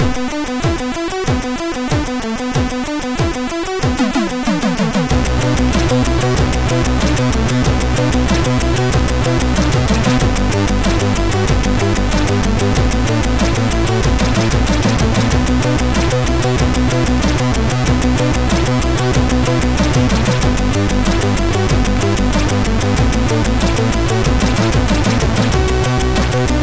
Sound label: Short Tune, MOS6581R3; sid